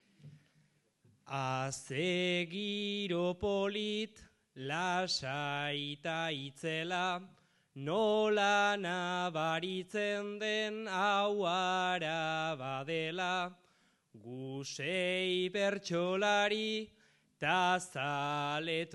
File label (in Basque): txapeldunaren agurra; aramaio